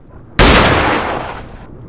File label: Desert Eagle Fire